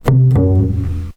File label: Upright F, Basses; Total Drum&Bass